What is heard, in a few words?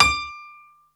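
piano D5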